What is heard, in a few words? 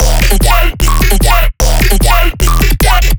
bass loop 150 D#; Virtual Riot